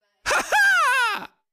hahaa sound effect

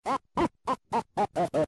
jajaja 8 bit

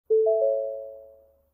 new ford chime

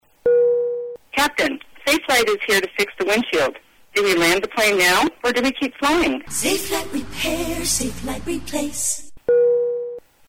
cracked windshield; tami

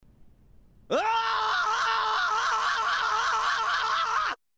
scream

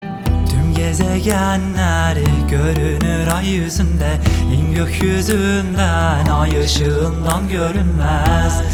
music

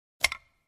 wooden button click sound

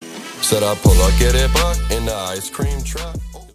ice cream truck

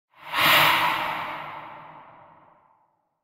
ghost light attack; FANTASMA; phasmophobia

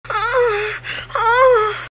moan only

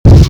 option noise; tony hawk underground 2

